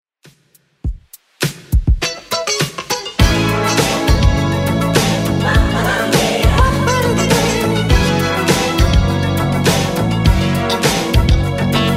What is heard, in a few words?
80s Redrum 102 bpm